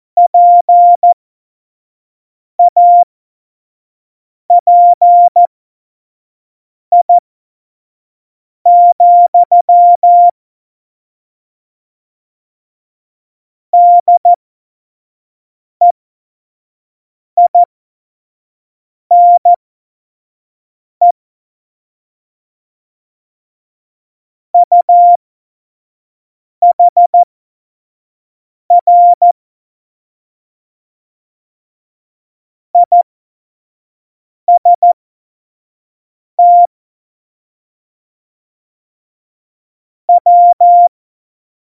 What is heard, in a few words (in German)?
Morsekurs, Tempo 25; Witze